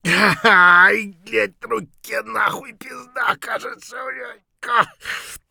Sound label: bear3 broke hand; Escape from Tarkov